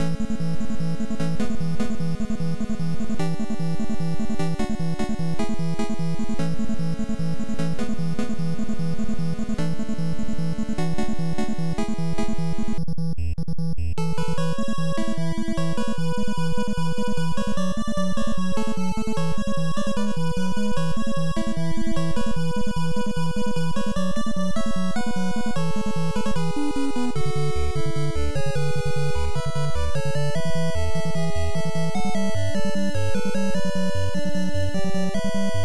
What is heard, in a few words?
Jungle; dragon spirit the new legend nes